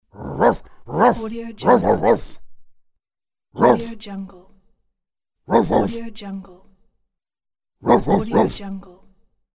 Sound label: big cartoon dog barking